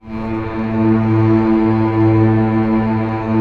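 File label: strings A1 PP L; Sound On Sound - Peter Siedleczek's Orchestra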